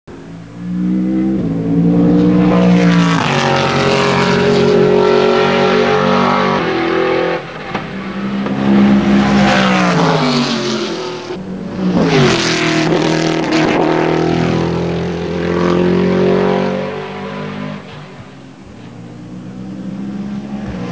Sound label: Car sounds Chrysler Oreca LMP; Le Mans; Le Mans Les Hunaudières